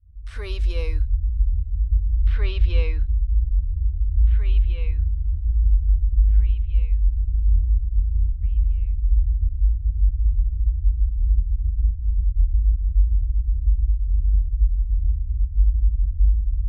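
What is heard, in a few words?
Production Element LFE Rumble; Sound Effects Bible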